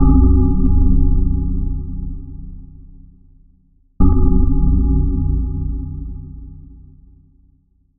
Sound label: Clock Towel Dark Bell